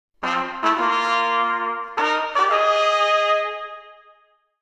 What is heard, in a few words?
Fanfare; openclonk